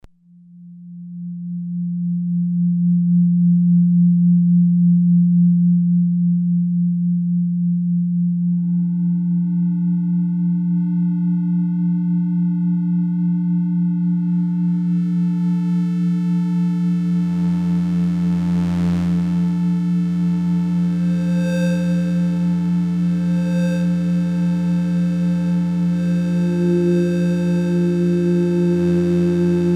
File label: Teenage Engineering TX 6 Synth Drones